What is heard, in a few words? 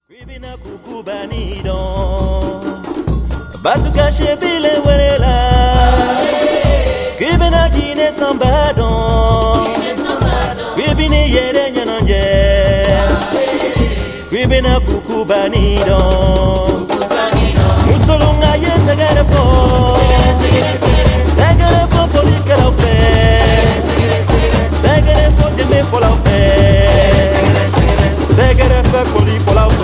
Guinea Samba